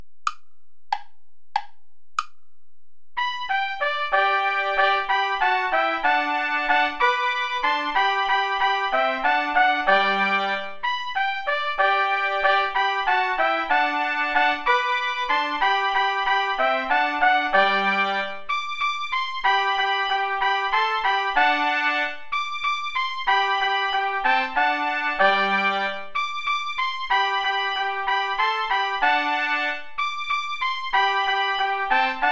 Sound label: traditional play along without trumpet; asikhatali